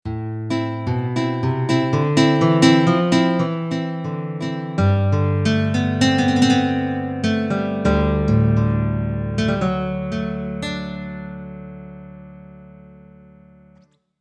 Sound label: Nylon Guitar